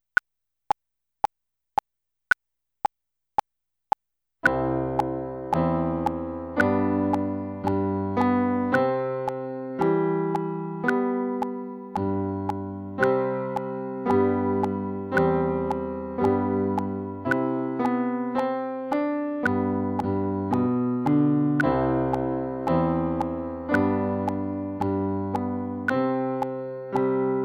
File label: Trumpet Voluntary Secondo